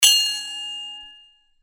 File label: Sword Sound; FXHome; Fighting Sounds